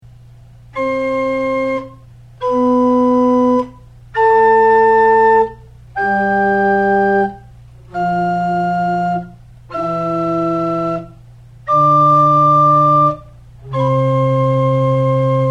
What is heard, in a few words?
big scale, organ